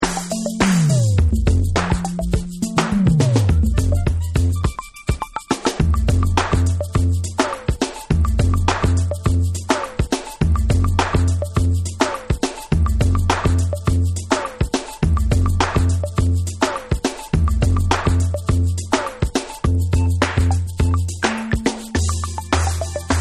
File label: Extended Instrumental